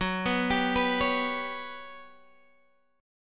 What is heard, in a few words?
G Major Sharp 11th F sharp, chord